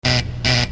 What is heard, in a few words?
Door Buzzer; Doors